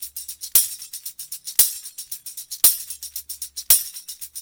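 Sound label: tambourine, Loops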